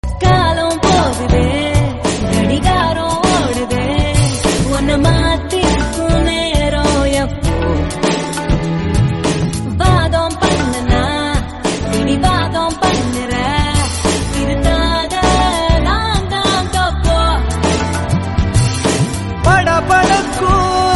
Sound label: Tamil song